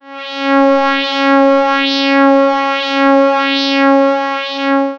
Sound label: accodent, collision